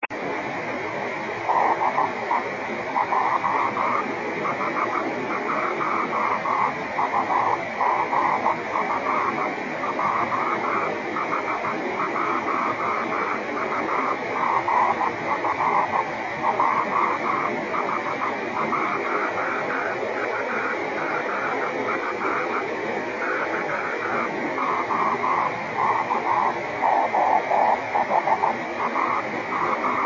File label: 24G beacon; 雨、気温２３℃ 湿度８５％; レインスキャッタ; JH1UGF 横浜市; JN1AYV 茅ヶ崎市